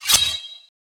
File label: sword